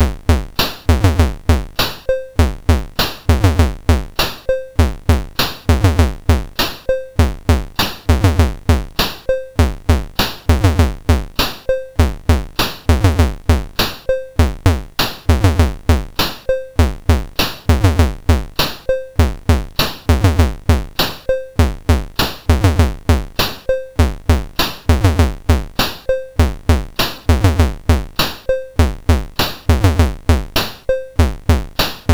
Radical Drums, MOS6581R4; SoundEffects

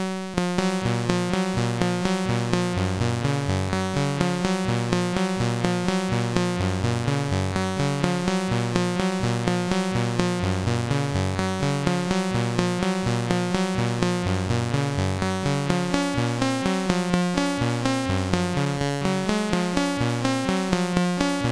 In a Loop note, sid CSG8580R5

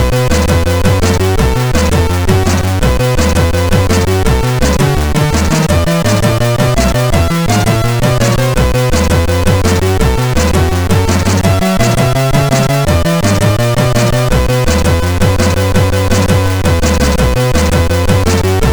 Rock n Roll, MOS6581R4

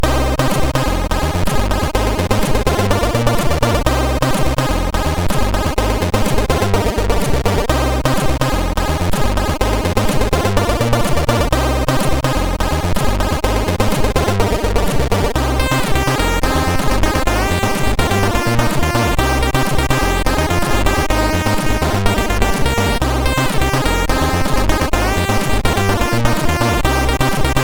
Bass Attack, MOS6581R2; hvsc

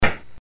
BASIC sid MOS6581R4, GAMES; Sword of Fargoal

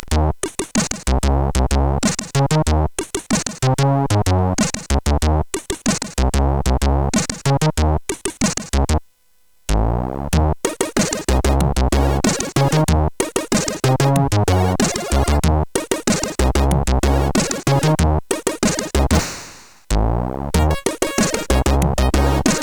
Funk, CSG8580R5

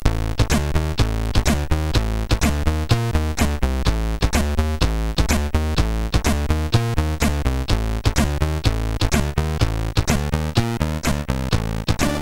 sid, MOS6581R4; Snowmen

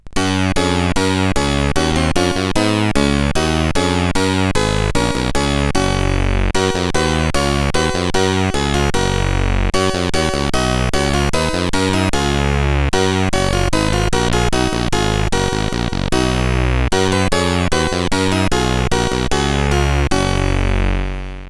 Bach CSG8580R5

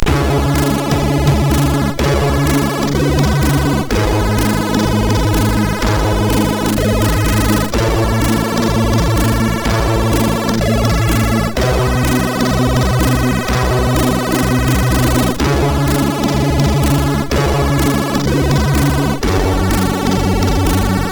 Hawkeye loader, MOS6581R4

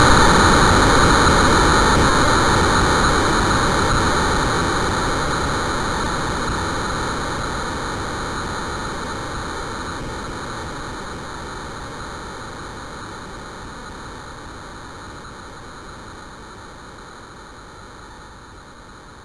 Frantic, MOS6581R4

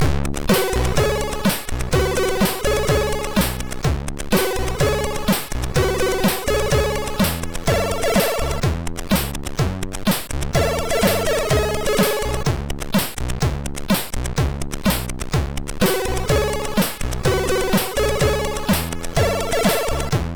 sid MOS6581

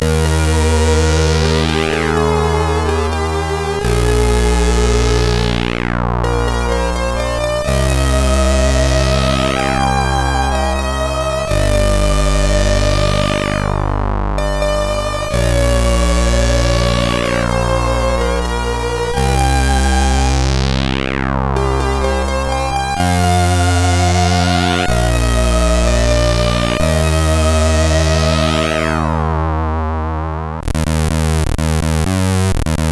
sid CSG8580; HVSC; Best Melodic Song

